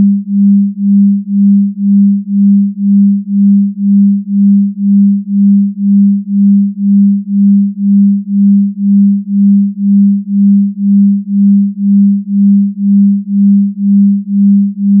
binaural delta

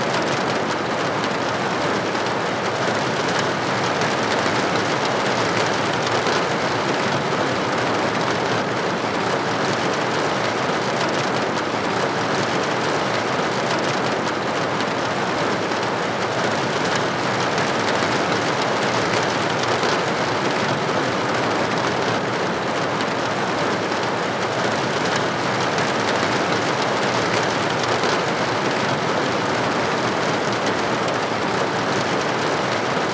rain on window

